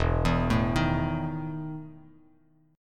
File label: E Major 7th Suspended 4th Bass Standard; EM7sus4 arpeggiated